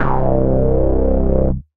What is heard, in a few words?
Past Bass; Drive and Glow; Ableton; Factory Packs